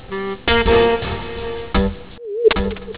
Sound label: funk verb